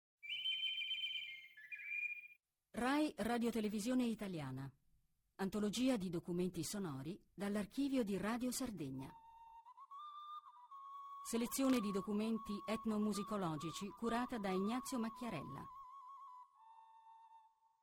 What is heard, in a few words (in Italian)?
polifonia a cuncordu, canto a tenore; archivio rai sardegna; musica strumentale